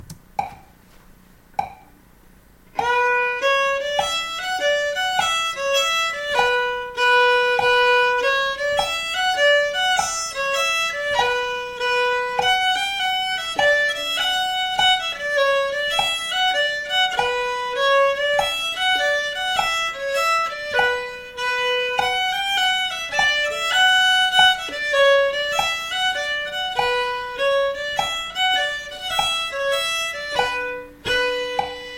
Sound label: gavotte